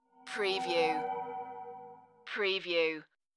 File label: Suspense